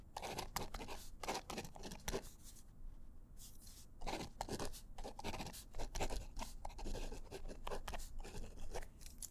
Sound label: Pen Writing; Books, Paper, Writing